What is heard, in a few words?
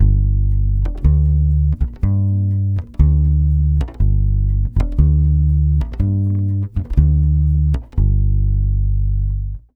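120 BASS G minor, Brazil Bossa; USB Soundscan; AKAI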